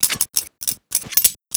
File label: knife look; weapons; Counter Strike Model Pack; CS2; bknife